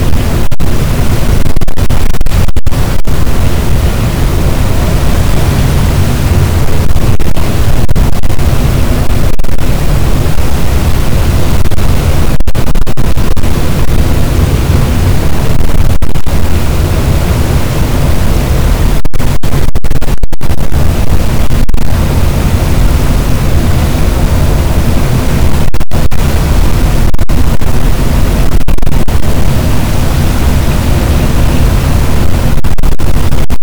cachalot, boat; Physeter Macrocephalus

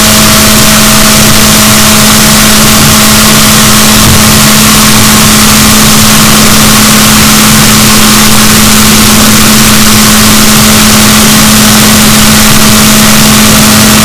rorquals negatif, Balaenoptera Physalus; boat; SNR -6dB

